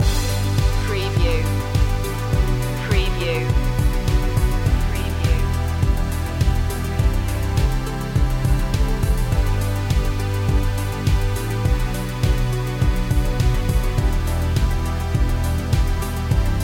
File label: deep dreaming loop